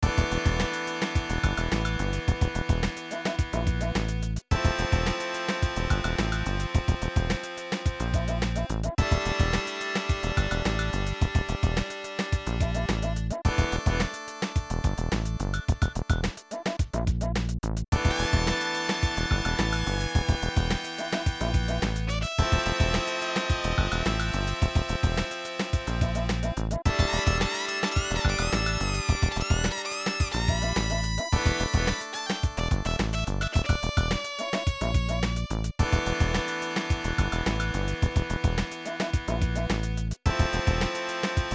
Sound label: Dungeon